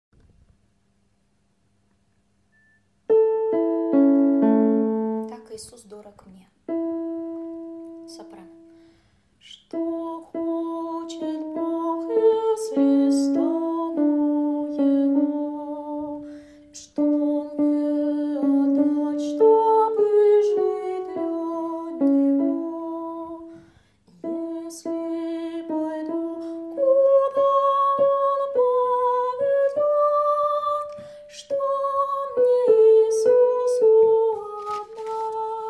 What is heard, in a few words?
soprano; Tak Iisus dorog mne